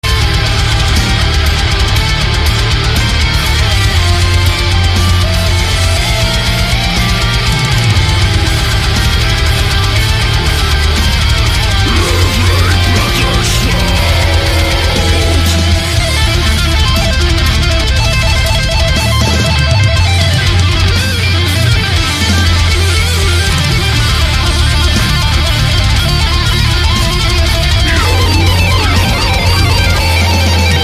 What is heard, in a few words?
guitar solo